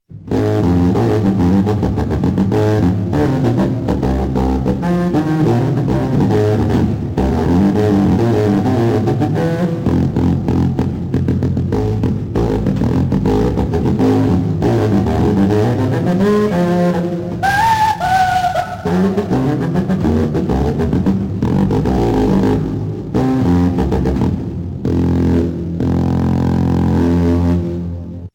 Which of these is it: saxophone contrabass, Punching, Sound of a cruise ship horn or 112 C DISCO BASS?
saxophone contrabass